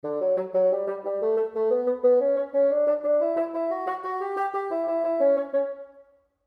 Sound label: Bass Clef Example